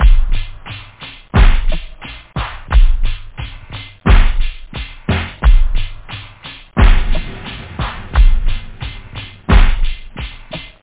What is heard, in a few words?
skull, loop, house; da capo vol1; instruments